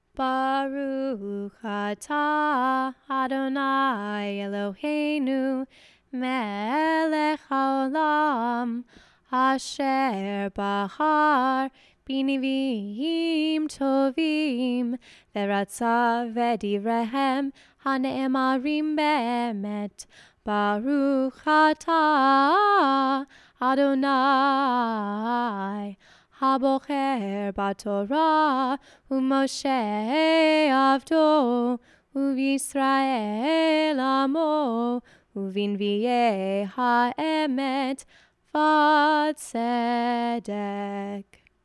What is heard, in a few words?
Blessing before Haftarah Reading